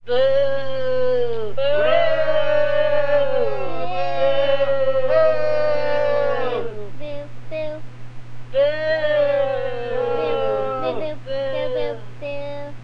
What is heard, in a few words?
Booing